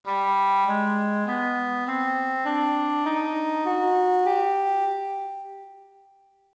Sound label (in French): chadda arabane, La gamme chadda arabane; GAMMES EN MODE DE SOL = NAWA